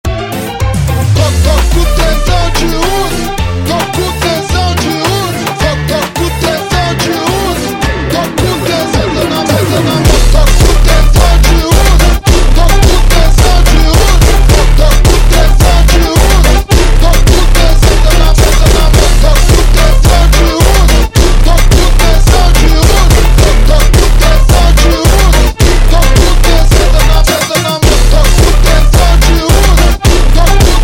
Bad Ending Jumpstyle slowed

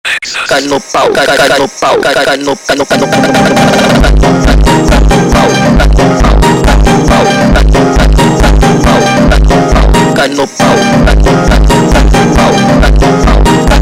sped Up; funk

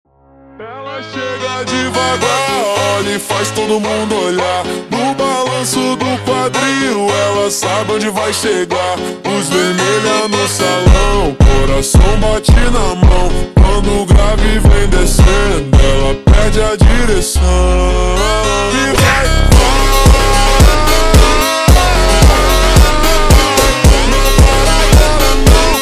Funk super Slowed

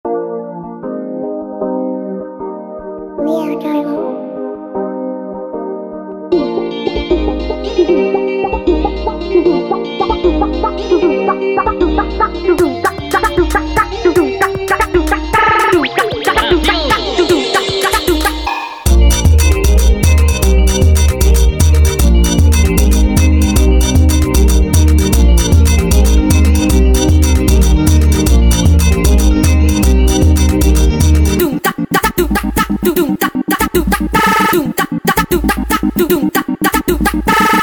Funk sped Up

